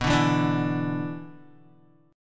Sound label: B-Minor Double Flat 5th, Guitar; Bmbb5 strummed; JGuitar